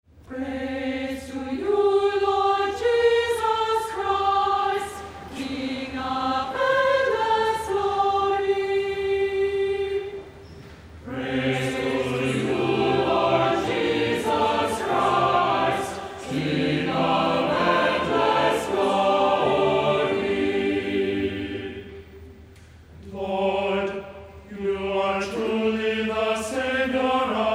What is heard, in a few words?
Gospel Acclamation; Lent III